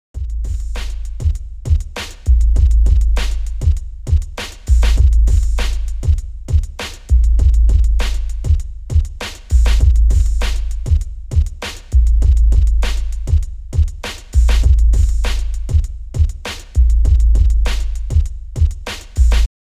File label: bass beat